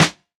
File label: clean snare drum sound e key